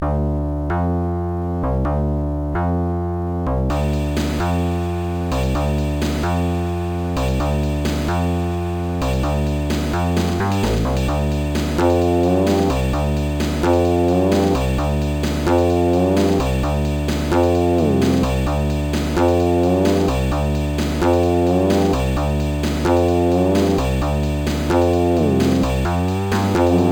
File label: bass